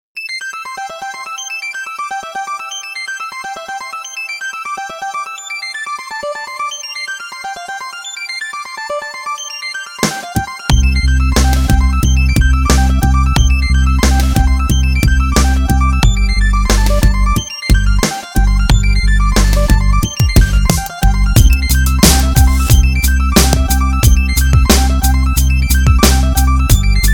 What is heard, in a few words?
frog arp